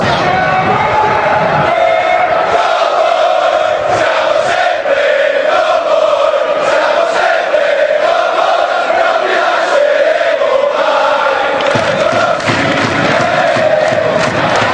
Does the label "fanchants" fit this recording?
Yes